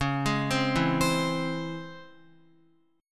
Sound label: Db Minor Major 7th Db Guitar; DbmM7 arpeggiated; JGuitar